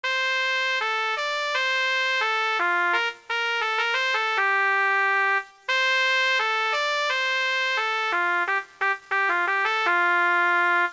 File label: TRUMP